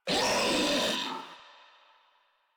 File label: Infested Ancient Player Spotted; Warframe; Proto Infested